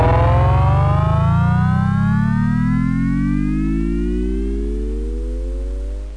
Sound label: soft going up; KinderGrid Demo